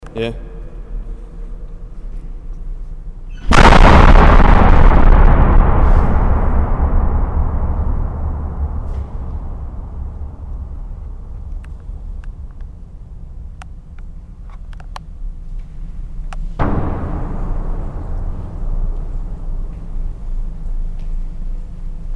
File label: background